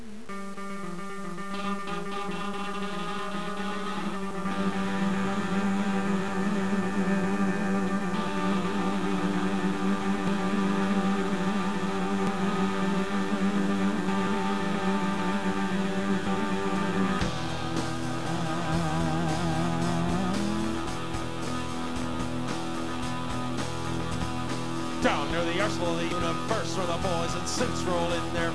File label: clar